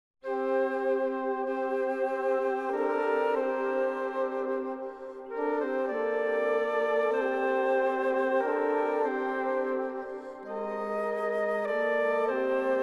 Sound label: Inst; Alleluias